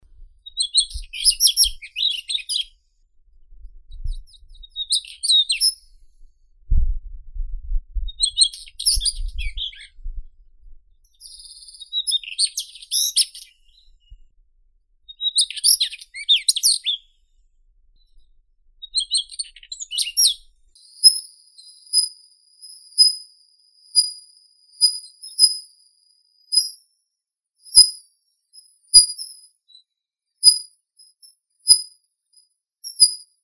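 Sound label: Siberian redstart, Phoenicurus auroreus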